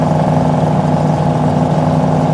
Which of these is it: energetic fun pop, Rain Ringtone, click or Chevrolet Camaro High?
Chevrolet Camaro High